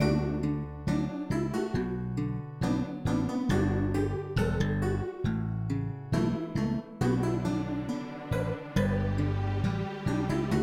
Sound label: Sad Village